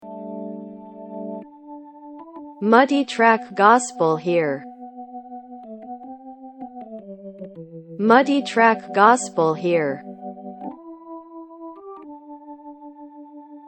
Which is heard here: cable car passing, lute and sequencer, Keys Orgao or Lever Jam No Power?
Keys Orgao